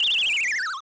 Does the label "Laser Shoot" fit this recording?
Yes